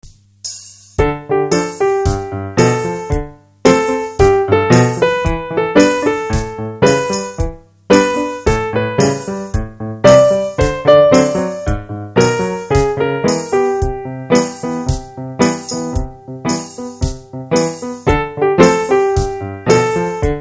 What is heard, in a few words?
piano